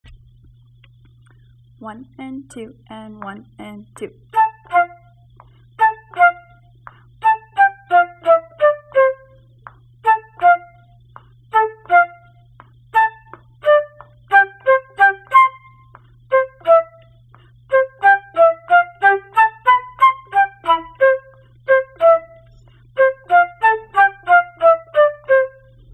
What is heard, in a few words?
moderato flute part